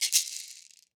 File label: Hosho Shaker; World Percussion; Cymatics; Emperor World Percussion